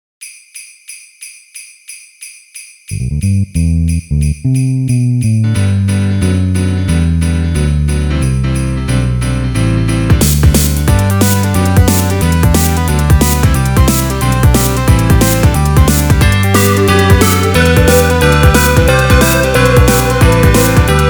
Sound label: little drum bass; holiday; weeklybeats-2012